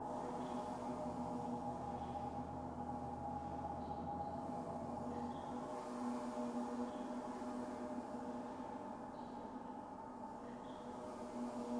hive atmos loop; Hollow Knight: Silksong

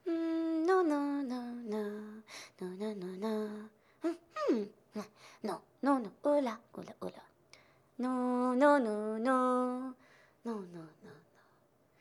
work rummage loop; Nuu

